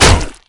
falling chandelier

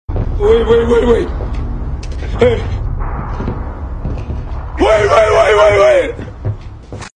panicking; punisher

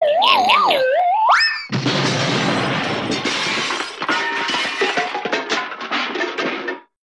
crash sound; Baby Einstein

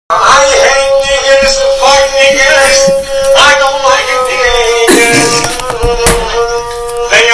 sings song

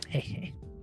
hehee

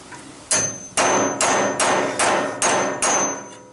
metal banging sound

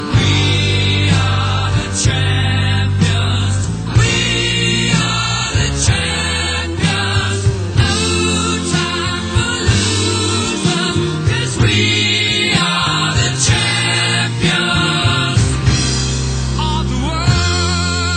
win music

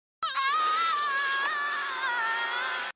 screaming

